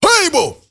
MALE RAVE, MALE NOISE, Heart of Africa

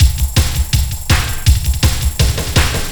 LOOP, Eurotech; Sounds Good

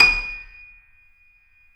C7 FF, The Ultimate Piano Collection; East West

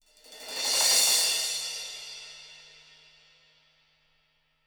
CYMBALS

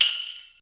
tambourine, Yamaha TG-500

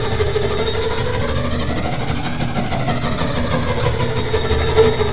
YAFX WIND, The Piano; InVision Interactive

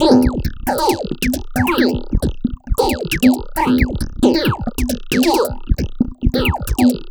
Drums Strange; Sonic Foundry (Sony Creative Software); Machine Language

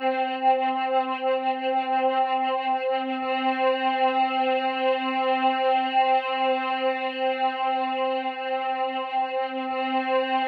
C4, Synth Leads

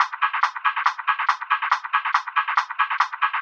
Loops 140 BPM Hihat Percussion Loops

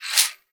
CAXIXI

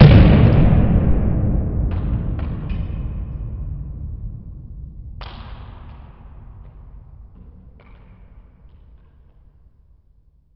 Impacts, Effects

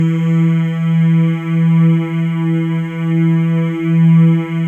MALE MMS, Symphony of Voices; Spectrasonics

